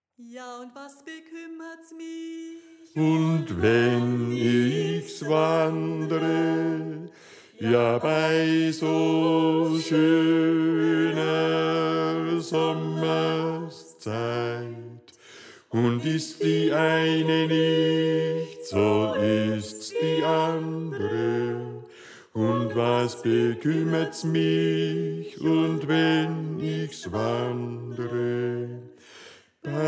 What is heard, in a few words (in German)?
Bass; Ja Und Was Bekümmerts Mich